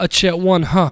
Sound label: VOX SUNG; Megabass Remix! 2